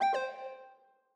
Hardware Remove; Longhorn XP Sound Scheme by David Mounder; Reincarnation of Windows XP Sounds in fake Longhorn (Samsung Theme for WinXP) style by David Mounder

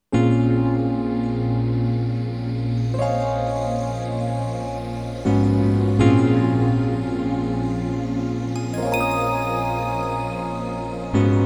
Jungle Vibe (Fender Piano Melody); Vaporwave

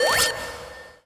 warning; Drunken Robot Pornography; Inago Rage

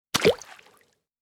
Ink Coronet; SE:Ink Coronet; Nintendo

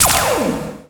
boss shoot; Drunken Robot Pornography; Inago Rage

